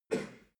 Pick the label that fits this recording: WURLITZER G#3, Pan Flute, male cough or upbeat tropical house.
male cough